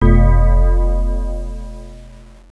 player connect

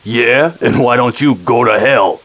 pianist

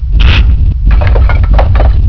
toolbox

